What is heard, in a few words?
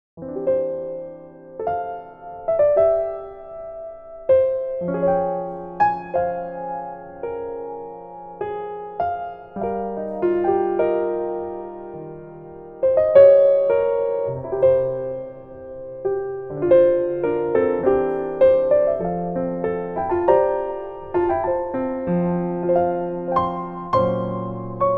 Piano Version; Infinity Nikki; Infinity Nikki Game Original Soundtracks Vol.13